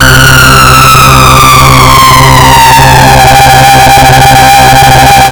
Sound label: FILT PAD, SYN SWEEP; Mirex